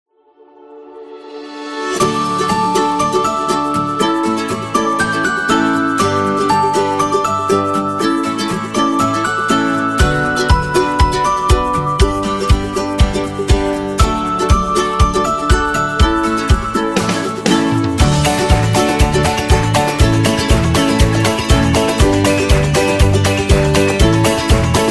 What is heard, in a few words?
Funny Comedy, happy